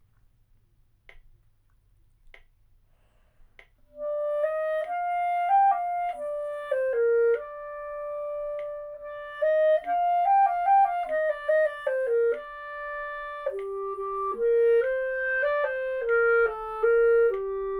Rimsky Korsakov Theme from Sheherazade With Metronome; Clarinet Student Resources